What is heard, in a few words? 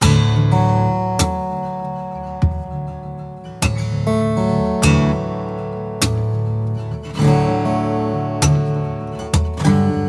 Optimistic Acoustic; MIUI14; HyperOS